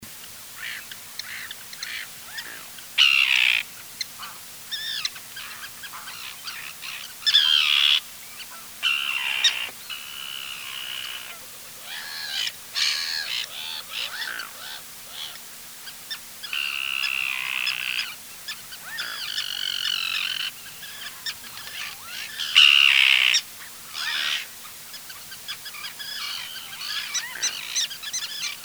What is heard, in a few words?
common tern sterna hirundo hirundo